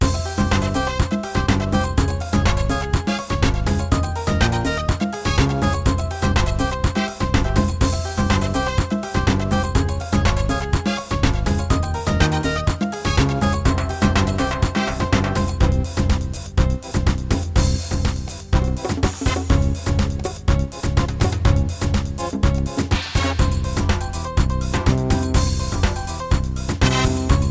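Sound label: batucada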